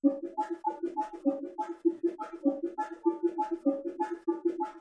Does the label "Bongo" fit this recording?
Yes